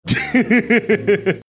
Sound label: funny laugh